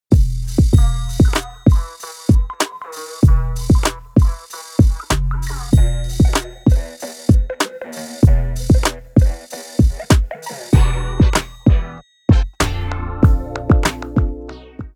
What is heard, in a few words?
INSTRUMENTAL